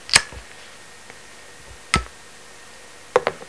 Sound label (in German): FEUER